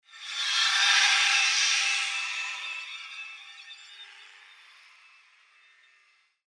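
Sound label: short ambience; crysis warhead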